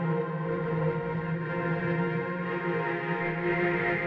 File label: chord